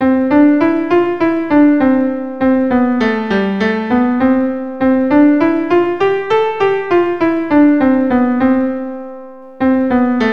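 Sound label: Finger crossover, keyboard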